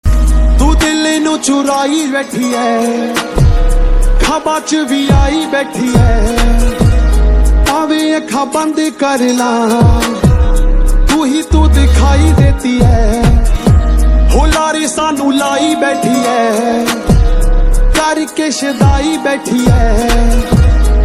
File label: Ringtone